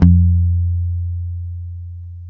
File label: SAWM F, bass; Spectrasonics; Bass Legends CD 1